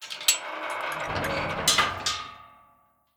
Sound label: gate close